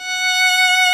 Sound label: VIOLINS F#4, LEGATO VLNS; Instruments & Percussions; InVision Interactive; Lightware VOL-1